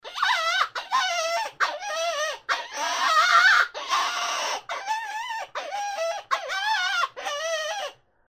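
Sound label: rabbit screams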